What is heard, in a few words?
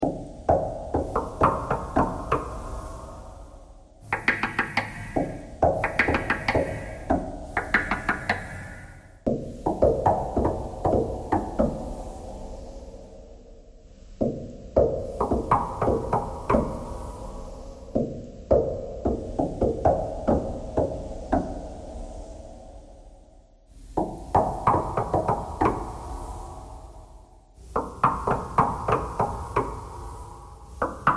knocking thread noise